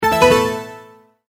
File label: Multimedia Tone-Return/Back